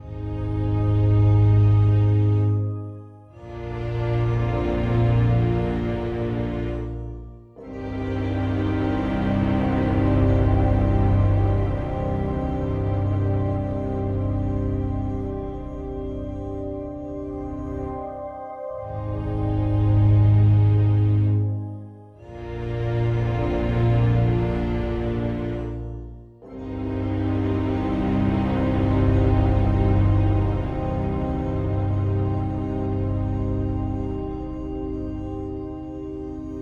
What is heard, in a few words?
Loop Sad; the walking dead michonne